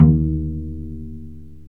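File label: strings violoncello pizzicato, Chamber; Roland L-CD702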